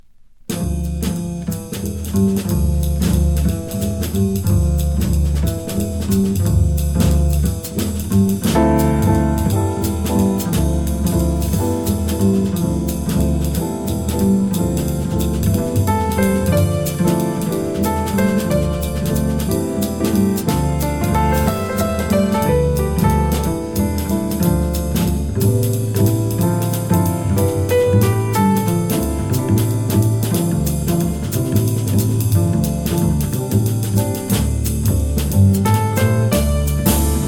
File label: valse